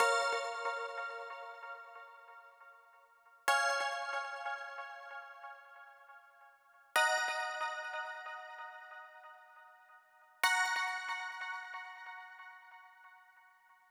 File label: Bell Hits, 138 BPM; Vengeance Samples